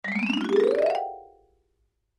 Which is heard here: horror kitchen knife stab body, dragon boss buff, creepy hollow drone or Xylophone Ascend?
Xylophone Ascend